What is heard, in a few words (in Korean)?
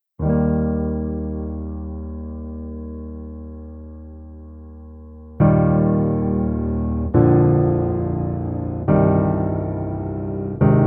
Piano ver, 남자키